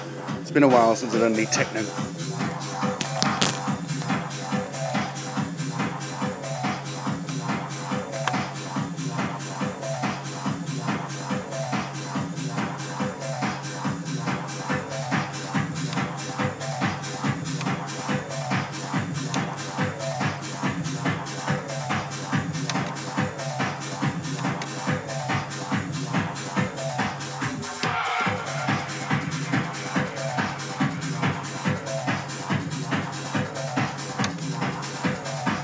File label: rare techno jam